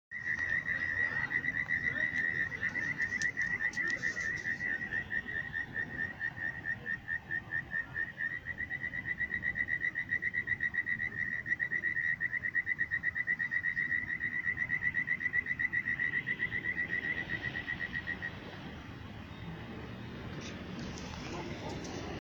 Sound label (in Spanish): Canto de p. thaul, Sapito de Cuatro Ojos (Pleurodema thaul)